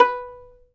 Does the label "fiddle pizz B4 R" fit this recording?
Yes